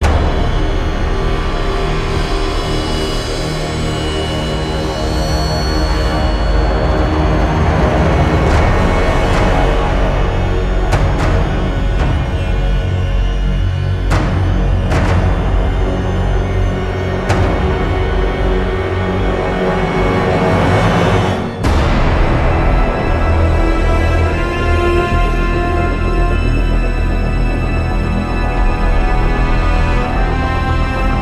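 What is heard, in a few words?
Tension loop; Call of Duty Modern Warfare 3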